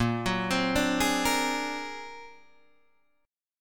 Bb Augmented 9th Bb, Guitar Open D

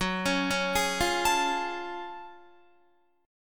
Gb Minor Major 9th, Guitar; arpeggiated; 13,16,13,13,17,17; JGuitar

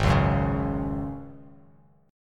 Ab 7th Suspended 4th Ab down; Ab7sus4 strummed

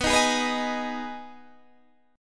Ab Minor Cb; strummed; Abm/Cb Chord

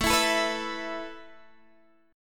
A Suspended 2nd A Guitar DADGAD, chord

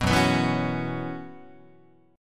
F sharp 7th Suspended 4th, Guitar; strummed; F#7sus4 Chord

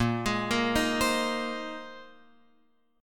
Bb 9th Suspended 4th Bb down Guitar Open F; arpeggiated

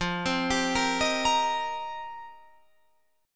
F 7th Suspended 2nd Suspended 4th, Guitar Drop D

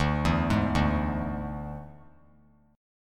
D Suspended 2nd Flat 5th D; 14,11,11,12; arpeggiated

